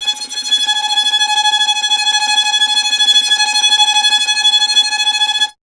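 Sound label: string violin tremolo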